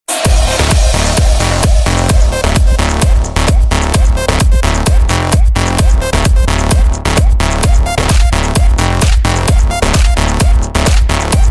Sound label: vocal mix, ringtone; english